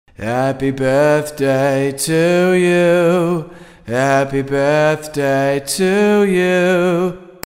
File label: chest voice